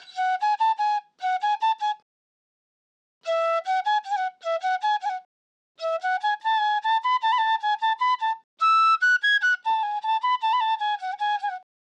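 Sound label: Hip Hop Flute A major 150 BPM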